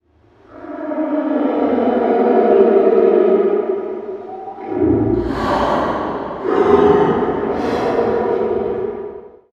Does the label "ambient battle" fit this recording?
Yes